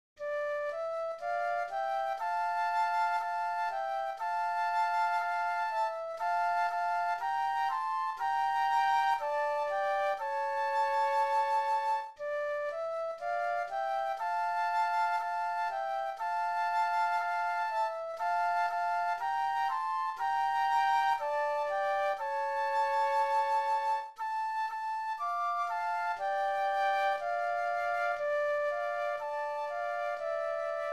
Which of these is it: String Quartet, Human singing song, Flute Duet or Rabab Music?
Flute Duet